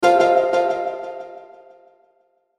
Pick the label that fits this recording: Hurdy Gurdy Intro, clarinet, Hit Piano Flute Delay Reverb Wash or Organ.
Hit Piano Flute Delay Reverb Wash